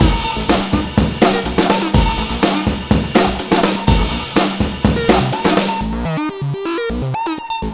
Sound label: DRM 124, Breakbeat